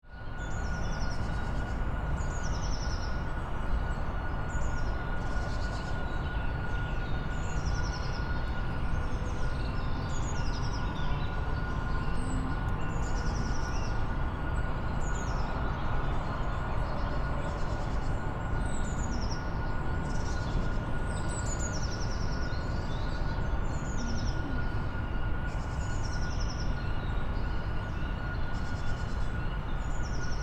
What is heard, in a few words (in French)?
forêt domaniale Champagnier, à proximité de la plateforme chimique de Pont-de-Claix